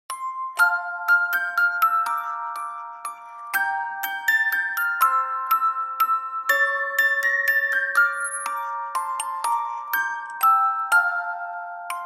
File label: Music Box We Wish You a Merry Christmas F Major 122; Long Version